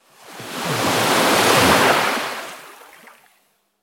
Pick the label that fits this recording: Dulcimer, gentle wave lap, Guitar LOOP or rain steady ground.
gentle wave lap